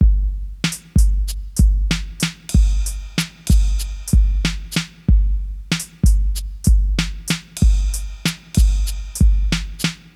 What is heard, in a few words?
Slow Hip Hop Loop 94bpm